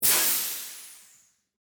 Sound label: Air Release Valve, Industry, Air, Release, Air, Compressor, Valve; Air Pumps; BlastWave